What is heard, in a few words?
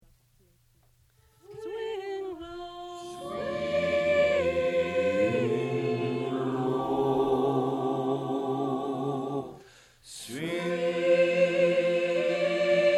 Swing Down Bass; training recordings